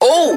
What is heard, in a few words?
"ooh" male